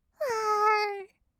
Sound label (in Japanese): "わーん", kawaii voice; 1200 kawaii voice!!; 色々なワンショット